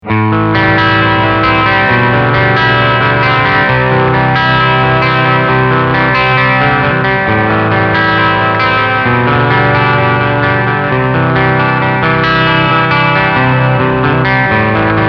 Clean Picking Steg Humbucke

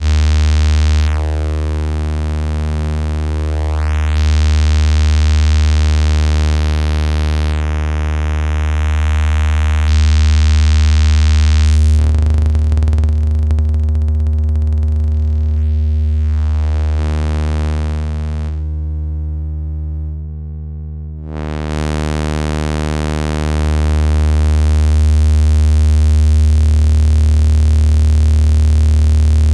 ADSR als Waveshaper; GRP Module

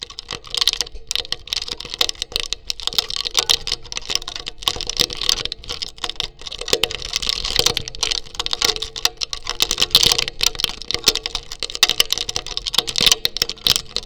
Soundbox Mk2 Federn auf Sandpapier 1 Pickup

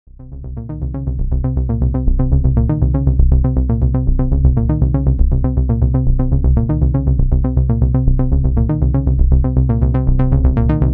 Roland JU06 Filter Sequenz

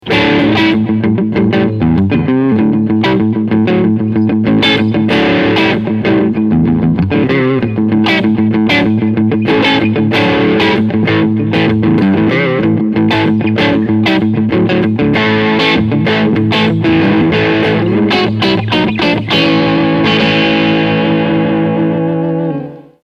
Crunchsound beide PUs

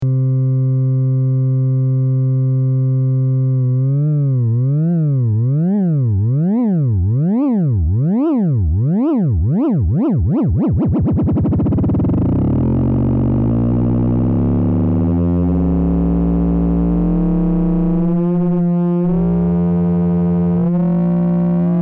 Lineare FM

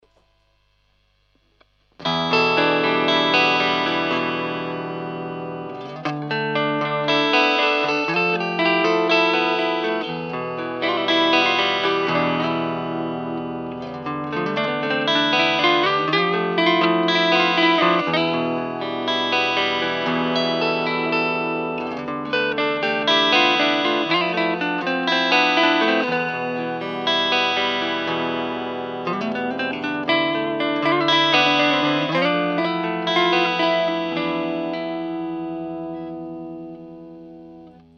Hals Pick Up Clean; Iron Cross Signature

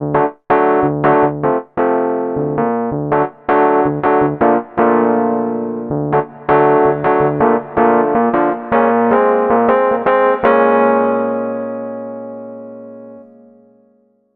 E-Piano

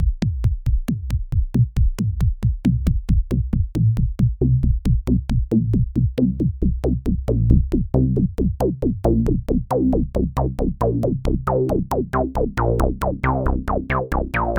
24dB Sequenz

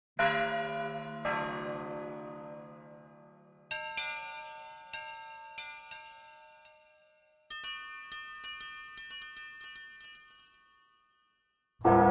glocken

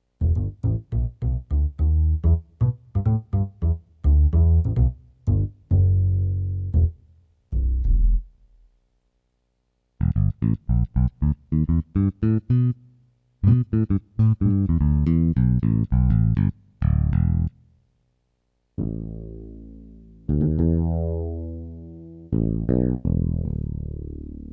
Diverse Sounds

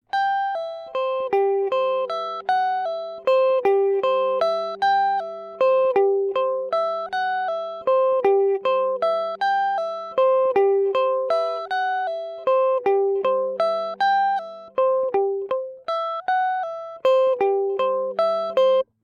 Gitarre Arpeggio

